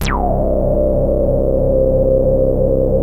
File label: ARP Odyssey